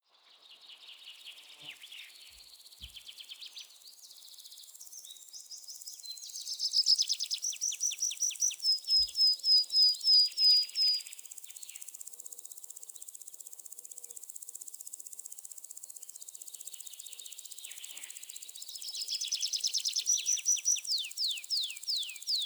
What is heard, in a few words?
day birds insects cricket, wald